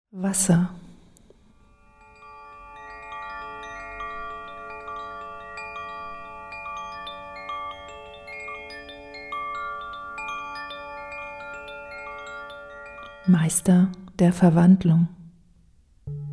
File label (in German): wasser